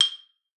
G6, XYLOPHONE; Xsample VOL-4

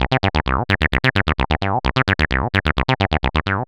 130 Cm bass, bass loops, trance

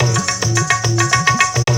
TABLAS; AMG - Now CD-ROM (Roland)

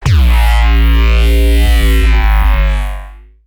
Reese; neuro; BIG5AUDIO

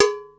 mambobell, Yamaha Motif ES drum machine; 300 Drum Machines; Yamaha Motif ES-Series

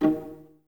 Violas D#3 Pizz; Miroslav Vitous; String Ensembles

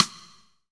SNR, Roland

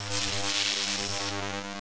ARC SEQ, ELECT NRG; Total Control - Action Cutz

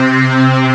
STRINGS; E-MU Formula 4000 Series Vol. 5; Protozoa

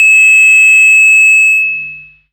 STRATBELL, Hard & Loud Techno; USB Soundscan vol.01; AKAI

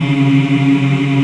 VOX African; Roland LCDP11 Africa VOL-1

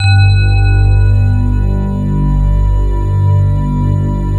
BELL PAD G1, WAVESTATION; Greytsounds VOL-1

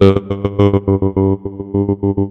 Korg, FX

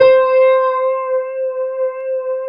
piano C5, 2 Step; USB Soundscan vol.51; House Side Of 2 Step; AKAI; PIANOS

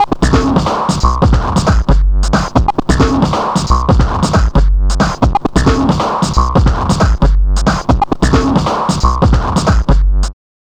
offrail 090bpm, downbeat dance grooves; Zero-G

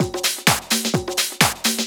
Latin Loop 128 BPM

